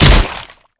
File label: rifle swing hit infected, melee; weapons